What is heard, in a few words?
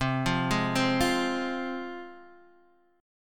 F Minor C